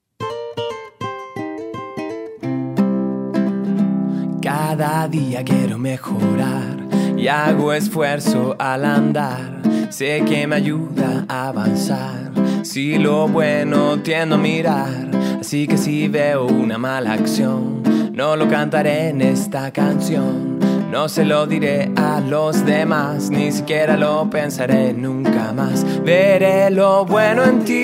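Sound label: Song, Spanish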